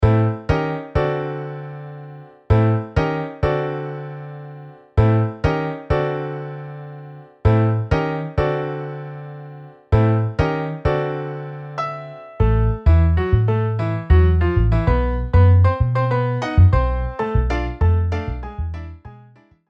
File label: Piano Bass MIDI